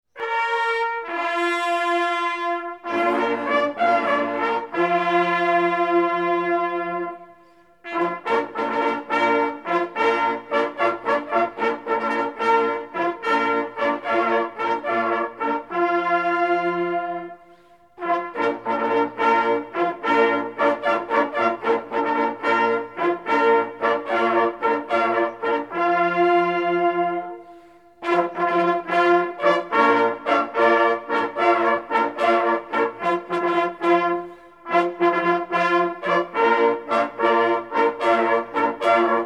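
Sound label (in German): Begrüssung, Jagdhornbläser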